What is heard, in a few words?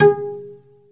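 SOLO CELLO, Synclavier Strings; ILIO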